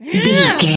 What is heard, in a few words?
voice female attack